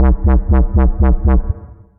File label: bass; Wallwow